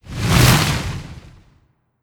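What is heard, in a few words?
Enchant Frozen Rose swoosh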